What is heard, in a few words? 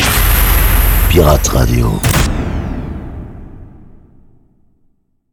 sweeper medium